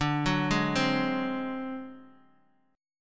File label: D 7th Flat 5th D down Guitar Open D